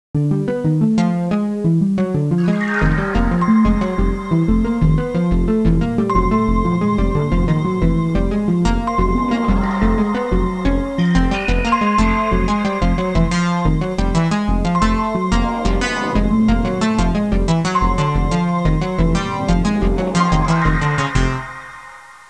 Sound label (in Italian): musica elettronica